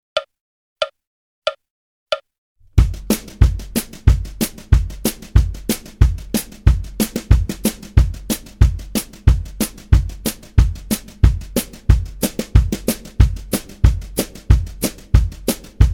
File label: Country Rhythm 92 bpm, Guitar; Guitar Aerobics Disc 2